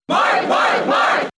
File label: Cheer English; Marth; SSBB